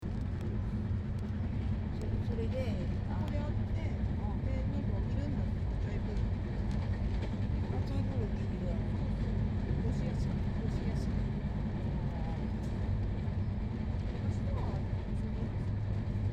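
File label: train, Inde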